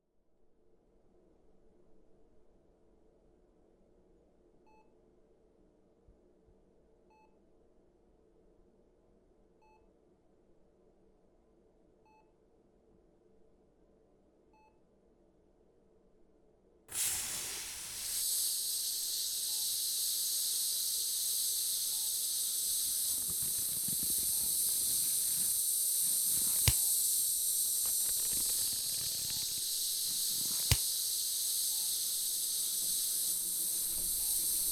Sedation therapy soundscape

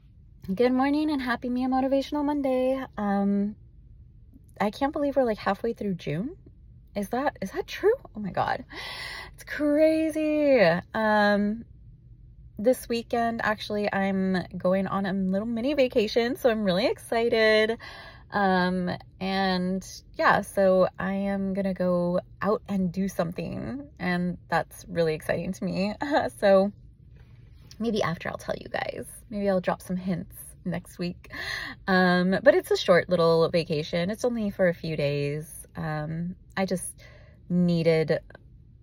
mmm

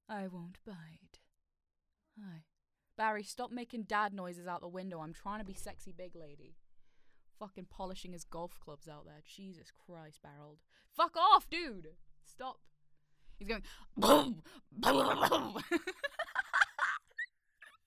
Dad cough

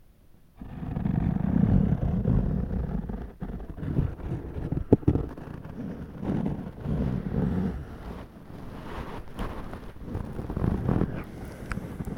thunder

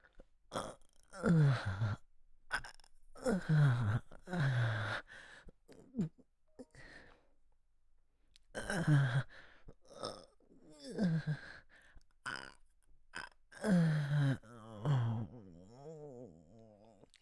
ooop

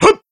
JUMP; ALL MIGHT

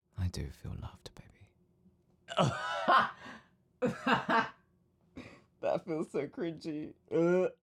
Cringe eurgh

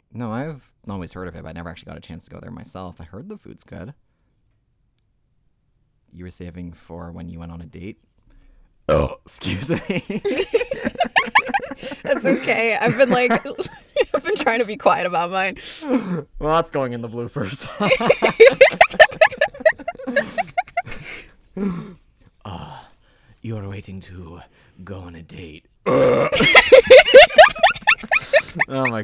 Unexpected Burping During A Collab

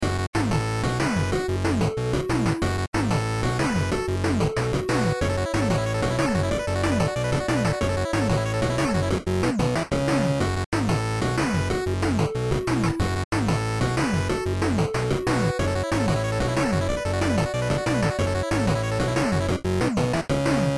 Weapon Get

